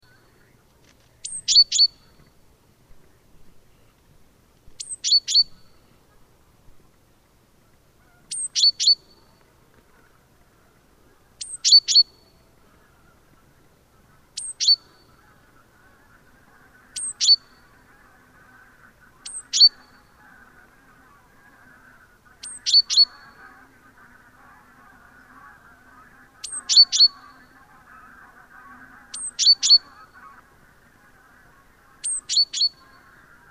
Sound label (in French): mesange charbonniere, chant